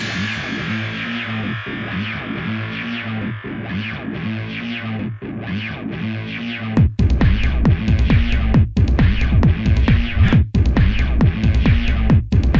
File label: Loop; Cannery Vale